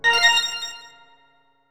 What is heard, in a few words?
Silver Coin